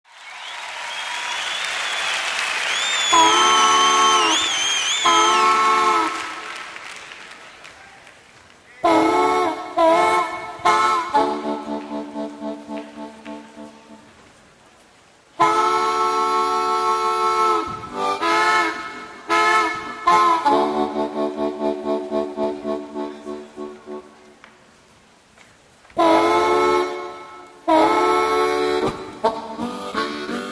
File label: train, harp